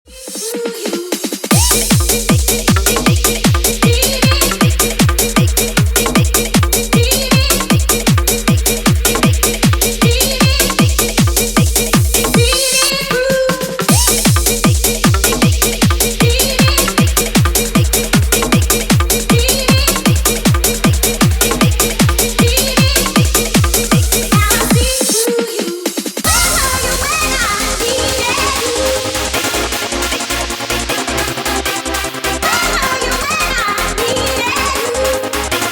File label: Bounce